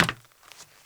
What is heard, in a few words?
metal, footsteps; 1000fx